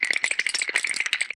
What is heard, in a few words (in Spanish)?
Traqueteo Calaveras; El Chavo: Una Feria en la Vecindad